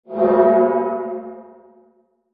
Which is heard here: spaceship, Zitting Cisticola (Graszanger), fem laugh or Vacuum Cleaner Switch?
spaceship